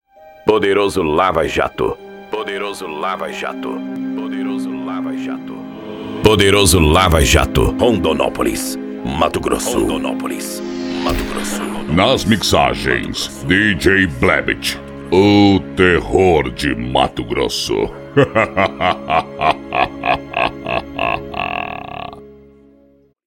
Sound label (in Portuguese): funk sertanejo